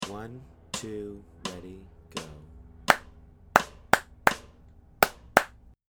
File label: rhythm practice